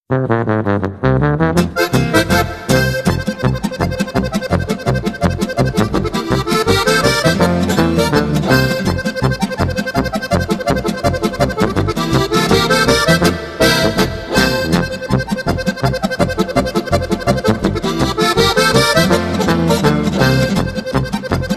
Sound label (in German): harmonika